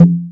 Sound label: hollow thump, Percussion Ticks; Misc Percussion